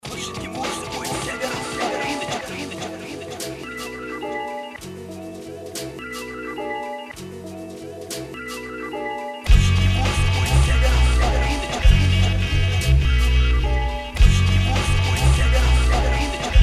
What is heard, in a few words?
beats - funk boom